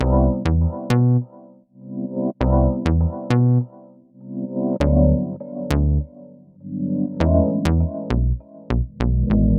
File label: Bpm 100 Bass Pad